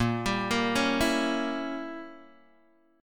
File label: A sharp Diminished 7th Guitar Open D